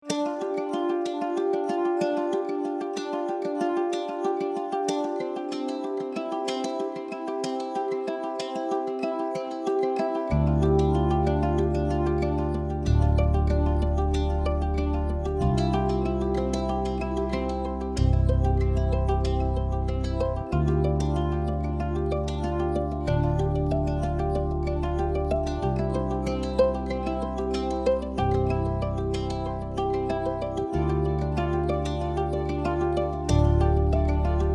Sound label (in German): Kuschel Uke